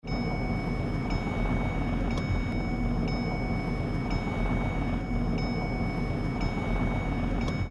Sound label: Chime belt reminder; ford